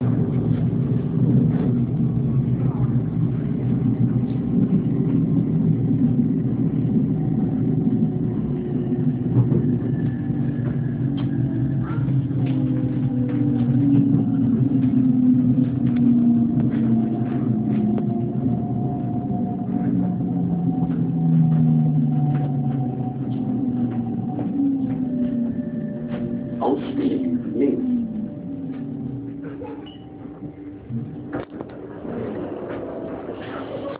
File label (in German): 474 Bremsung; Bahn